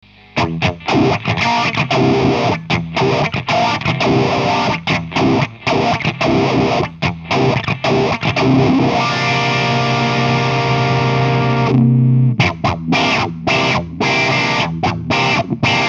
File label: Mutron, filters; Mutron3